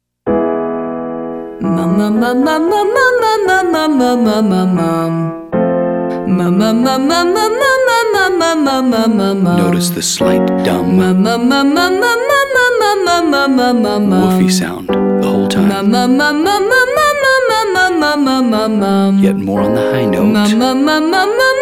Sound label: Exercise mum mum females; singing lesson